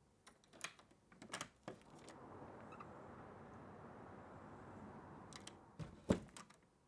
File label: Door Full